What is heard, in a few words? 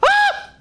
ahhh; matoro